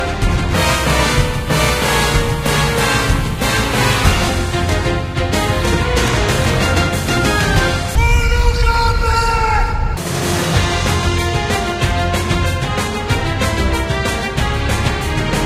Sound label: remix